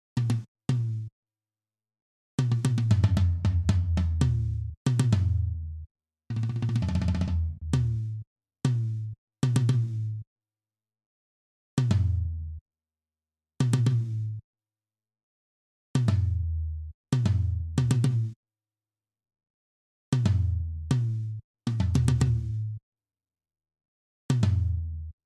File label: gate on